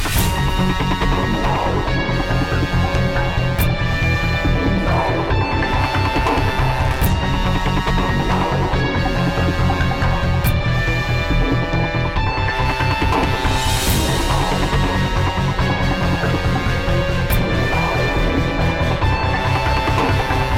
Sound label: Desert Boss Loop; Infinity Blade 3